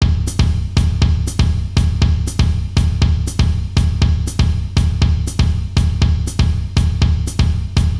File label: Baiao 60 bpm